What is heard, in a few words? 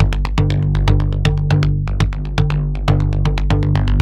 TALK, TALKDRUMSYNT, Yamaha VP-1; Music Store